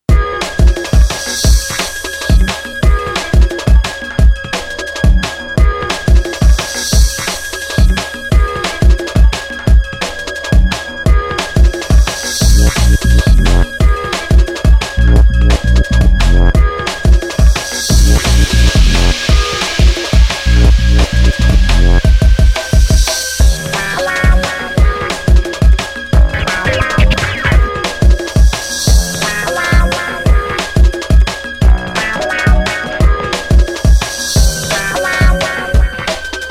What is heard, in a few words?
A Mad Jungle Ting